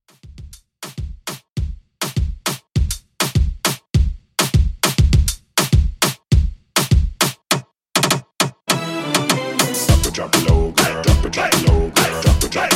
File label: Hype Intros Dancehall 101 Bpm